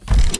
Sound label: robotic